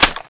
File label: clip in; tfa cso; hk121